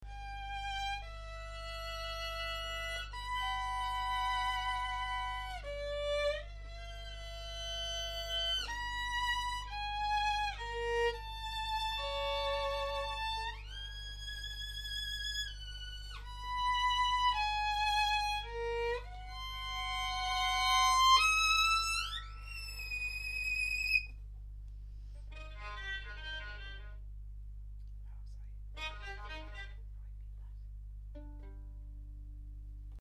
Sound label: violin